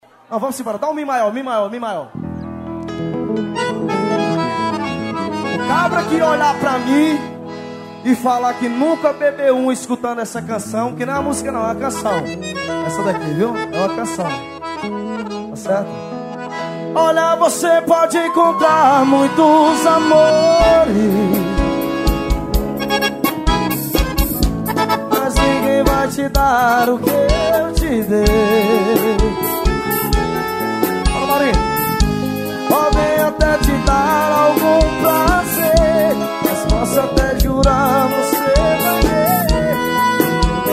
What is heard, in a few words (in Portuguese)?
forro